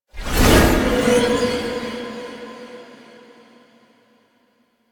Horse Disappear; Foley Actions; Warframe; Vehicles; Duviri

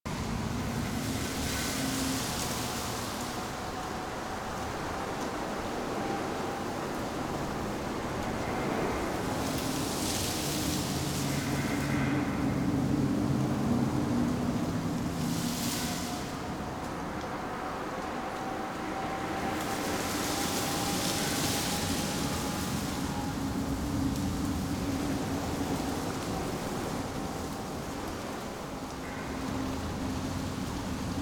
Exterior Foreground Forest Scared Sad Loop; Ambience; Duviri; Warframe

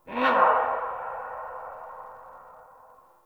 Night Creature Distant; Ambience; Night One Offs

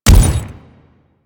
Step Default; Warframe; Grineer Ghouls; Ghoul Devourer; Footsteps

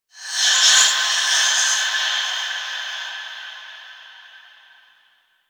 Breaths; Ambience; Warframe; The New War; Quests; Zariman

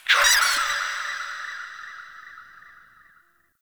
Hallow Spirit Vocal Death; Halloween

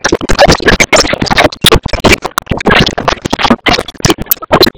Object Temple Defense Wave Clear

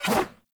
Sword Whoosh

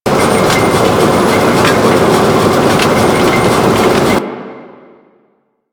Conveyor Belt; Objects

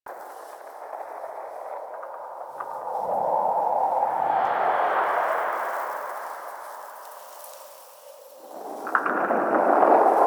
Exterior Wind Mid Loop; Warframe; Infested Eris; Ambience

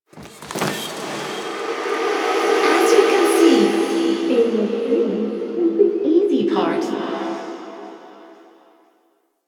Interior Cave, Ambience; Zariman Thrax Memories; Warframe; Duviri

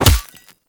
Melee Fist Impact Robotic Flesh

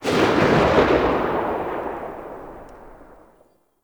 Sniper Alt Fire Tail; DE; Warframe; Frumentarius; Exalted Sniper

